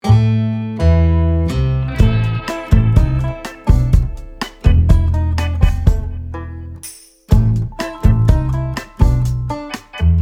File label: Score Comedy; Christine Perfekt war gestern